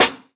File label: Snare, jungle breaks drum sounds